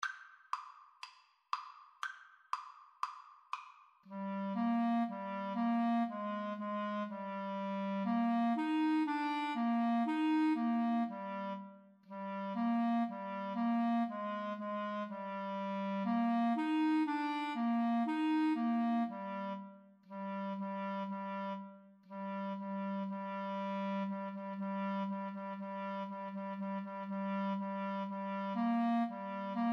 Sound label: old macdonald Clarinet Duet